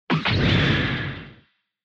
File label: Retro Anime Robot High